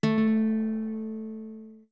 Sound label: Guitar A long; Zora guitar